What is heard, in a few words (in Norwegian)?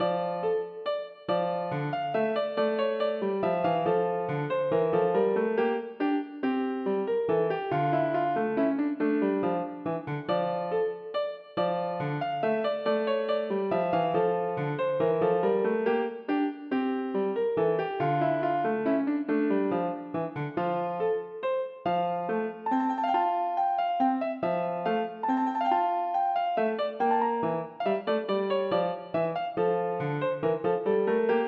Menue for piano; Bang